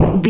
retro impact hit general

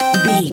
retro collect item stinger

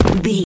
footstep dirt land

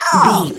goblin fairy hurt pain

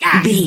voice male hurt pain

